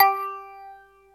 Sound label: throat, JD8 attack